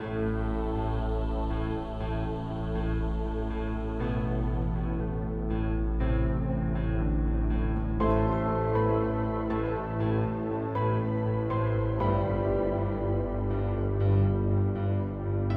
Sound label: Dungeon; Futurecastle2 Dungeon